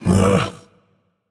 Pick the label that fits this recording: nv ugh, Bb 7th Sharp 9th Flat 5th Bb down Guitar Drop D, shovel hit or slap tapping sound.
nv ugh